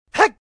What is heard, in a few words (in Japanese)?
kougeki jaku, 攻撃弱, 喧嘩好きアウトロータイプ02; 熱血とか荒くれとかそう言ったコンセプト。一番地に近い自然演技。; ゲーム用フリー音声素材集; 追加入力で派生があったり、隙の少ない突進技でラッシュを組めるキャラが合うかなー